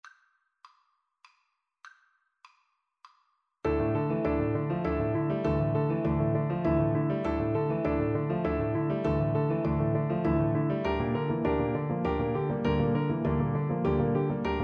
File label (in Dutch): Ostinato met metronoom